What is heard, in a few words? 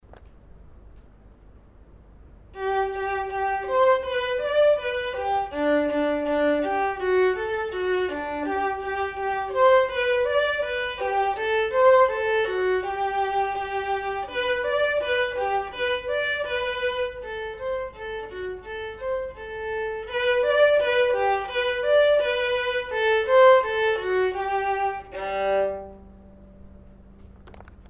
perf vln